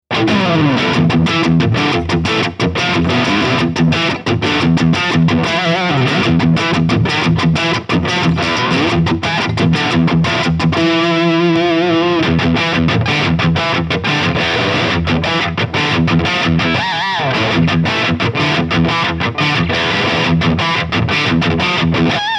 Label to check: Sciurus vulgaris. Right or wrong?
Wrong